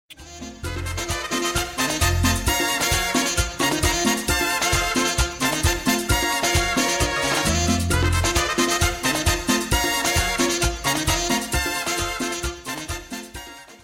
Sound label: Bachata Sms